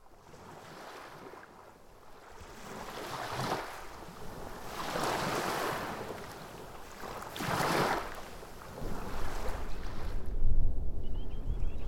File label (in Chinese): 東沙海浪